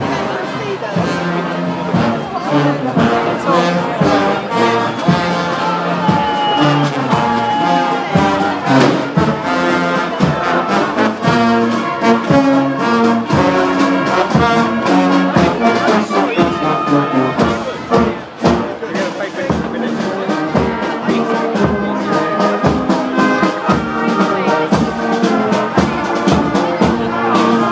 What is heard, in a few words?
bonfire music